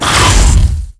NPC Killed

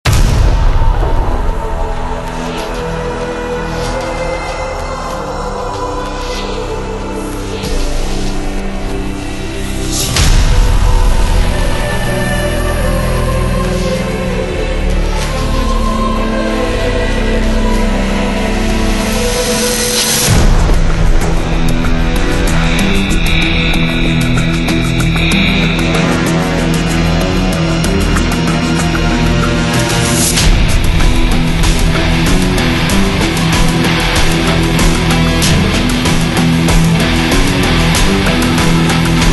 Music Eerie Otherworldly

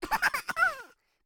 kobold flyer death

